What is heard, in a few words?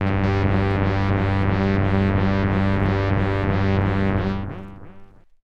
Jupiter4 Saw Delay Vinyl Synths G1; vinyl leads